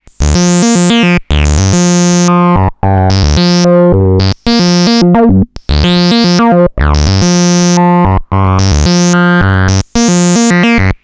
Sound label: Liquid Foam Loop; HerbsAndStones